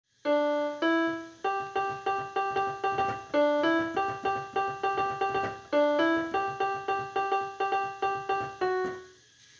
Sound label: piano